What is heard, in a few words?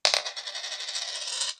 item coins, Magic And Spell Sound Effects